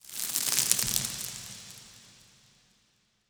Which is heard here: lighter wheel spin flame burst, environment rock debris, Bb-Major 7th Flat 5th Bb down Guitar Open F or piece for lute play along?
environment rock debris